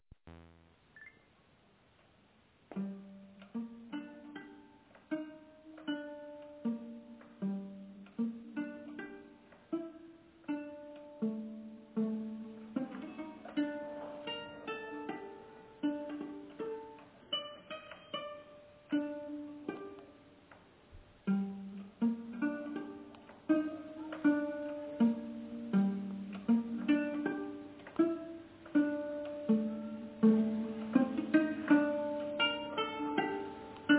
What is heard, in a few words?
Silverpolskan pizz, nyckelharpa